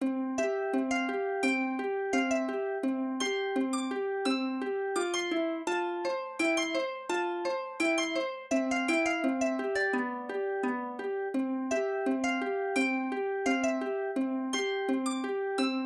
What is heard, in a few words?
Harp Rondo; This is the only expressly imitative piece here.; Tombeau is an old name for music written as a memorial to some